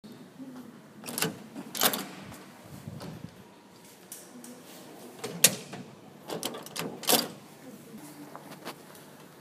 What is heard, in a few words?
open door